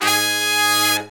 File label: BRS SHRT, R&R Horns, Sect; Roland L-CDX-03